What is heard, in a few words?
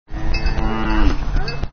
cow